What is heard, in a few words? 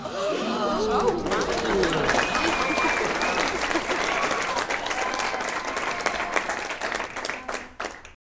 crowd Reaction guild big Reveal; spellbound